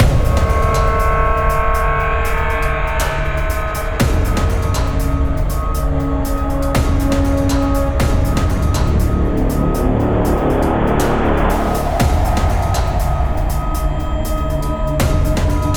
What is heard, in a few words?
synth uprise; sr5 Dawn Of The Artefact; Ambiance; FoundryVTT